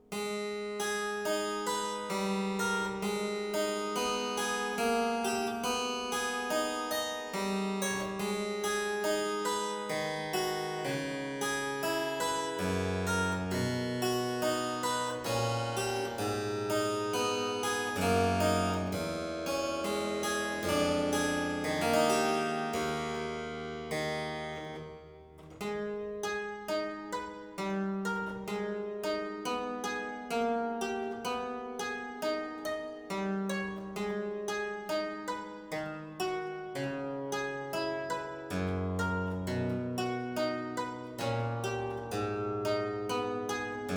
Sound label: Suite in A minor Sarabande, Harpsichord; Buxtehude; Complete Harpsichord Music; BuxWV244